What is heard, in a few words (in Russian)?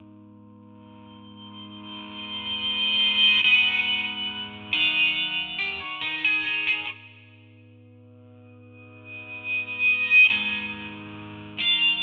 zatsiklennye melodii dlia gitary loop